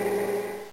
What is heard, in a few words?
jump